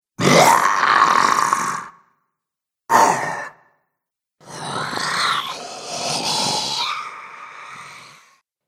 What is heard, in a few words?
Zombies Selection, Zomby Sound Effects; horror